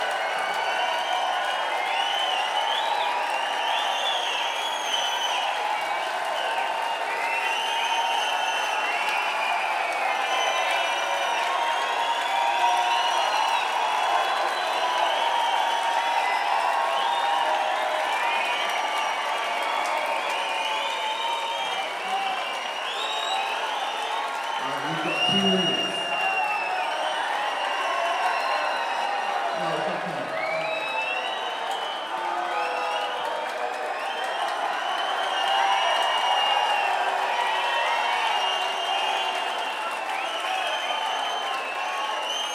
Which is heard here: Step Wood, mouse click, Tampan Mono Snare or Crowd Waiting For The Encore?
Crowd Waiting For The Encore